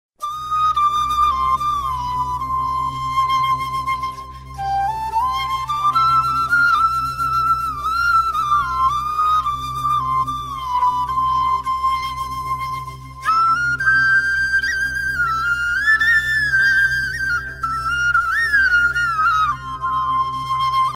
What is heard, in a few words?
flute ringtone smooth instrument